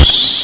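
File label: alien grunt fire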